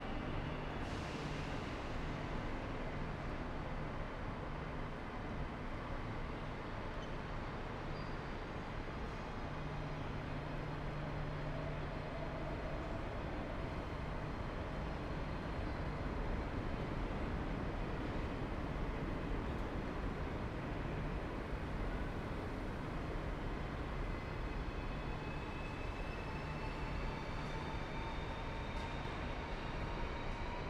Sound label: Atocha Train Station At The Rails Announcements Hum Passengers Traffic, Madrid; Systematic-Sound; General Ambience Series; Sounds Of Madrid 01